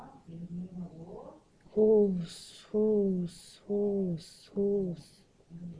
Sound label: hose